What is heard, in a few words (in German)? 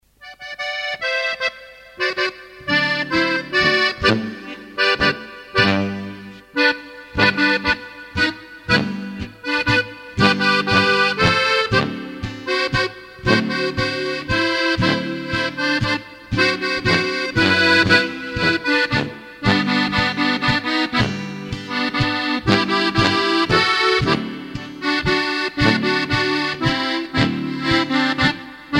Plattler; Alt Rosenheimer